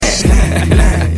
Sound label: laugh; pitbull